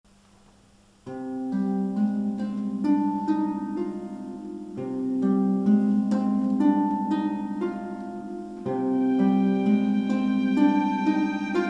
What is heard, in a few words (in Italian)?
Arpa & Violino